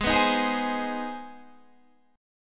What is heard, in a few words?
C Minor Major 7th Sharp 5th G sharp